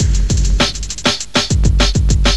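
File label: bumtacatac beat; Urban Shakedown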